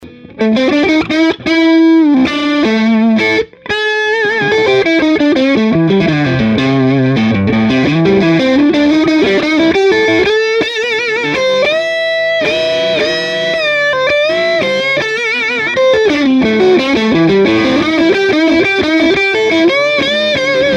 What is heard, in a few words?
neck, EQ, comp tests